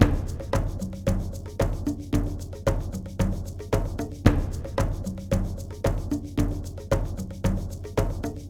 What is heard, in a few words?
Ensemble, Latin Percussion